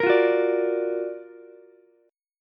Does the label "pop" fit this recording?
No